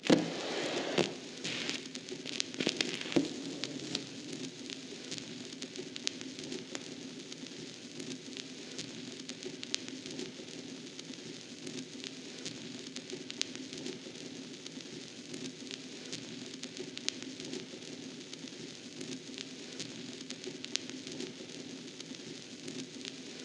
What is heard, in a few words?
loading needle loop